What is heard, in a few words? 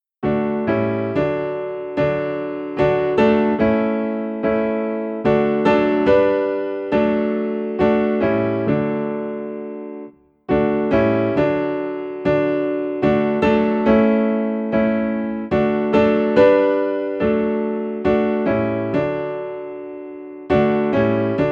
Come Thou Fount in D Piano